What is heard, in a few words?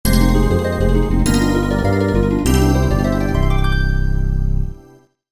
Victory Fanfare; Secret of Evermore